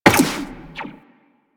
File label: Step Zipline; Footsteps; Walk; Warframe; Tenno